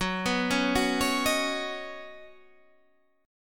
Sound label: Db 7th Suspended 2nd Suspended 4th Gb